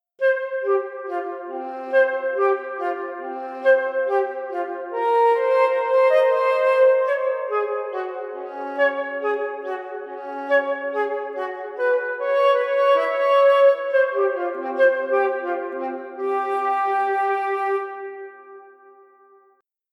Flute; OpenWorld

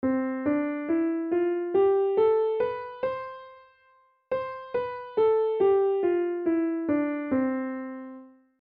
Major Scale

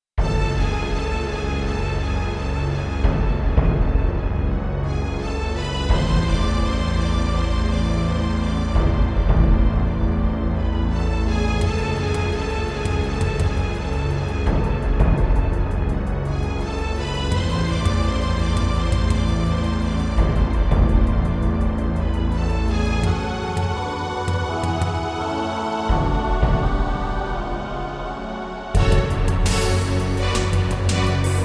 Harmonic cyberpunk

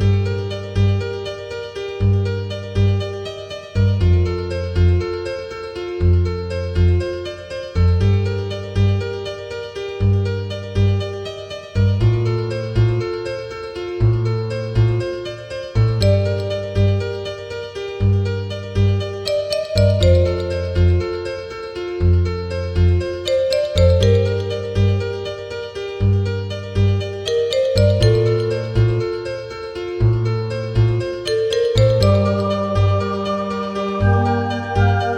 deepest pain sad theme

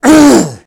Scream

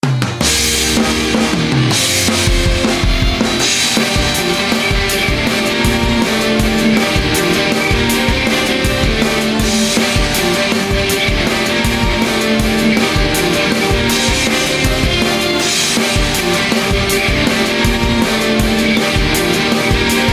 Victory Fanfare

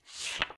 Page Turn

